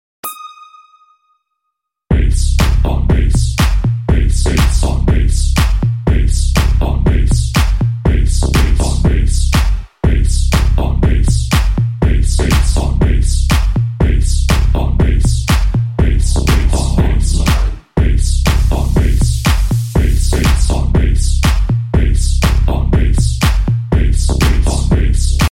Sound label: Bass Dancing Bass